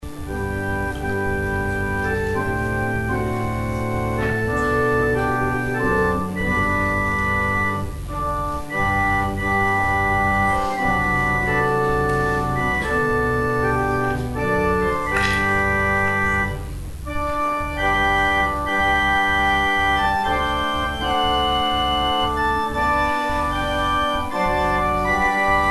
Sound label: organ interlude